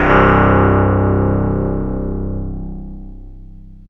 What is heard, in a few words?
PPG bass C2; Zero-G Ambient 1; PPG BASSES